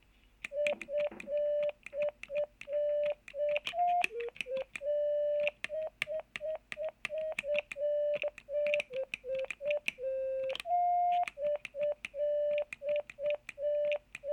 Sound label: Jingle Bells Self Played